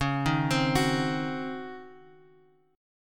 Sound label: Db Major 7th Suspended 2nd Suspended 4th Db down Guitar Open F; DbM7sus2sus4; arpeggiated